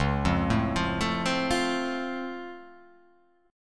C sharp Augmented Major 7th down, guitar chord

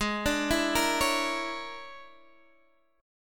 A sharp 7th Sharp 9th Flat 5th, guitar chord; 16,17,14,15,14,x

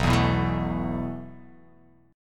B 7th Suspended 4th B down; B7sus4 strummed; chord shape; JGuitar

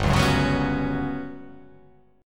G Major 7th Flat 5th

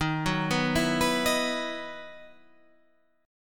E Major 7th Suspended 2nd D sharp

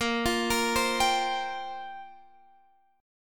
C 7th Suspended 4th

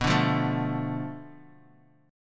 B Minor 7th Flat 5th B Bass Standard, chord; Bm7b5; strummed; 19,17,15,14; JGuitar

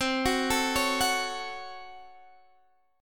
C sharp Major 7th Suspended 4th Sharp 5th, C

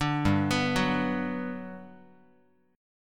G Major 7th, Guitar; GM7 arpeggiated; 5,3,2,4,x,x

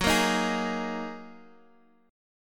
Gb 6th Add 9th Gb down Guitar Open F; Gb6add9 strummed

